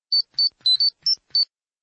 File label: ringtone power rangers communicator; zordon